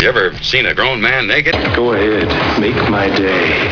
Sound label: Dirty Airplane